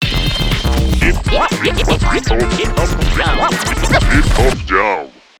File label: Radio Hiphop jam Jingle; Daigasso! Band Brothers; 3DS